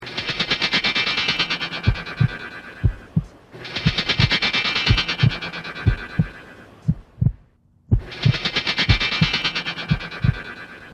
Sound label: bionic running